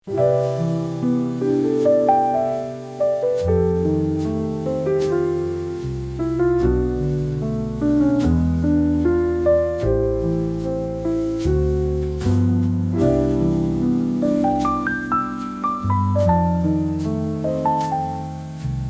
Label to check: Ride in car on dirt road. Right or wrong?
Wrong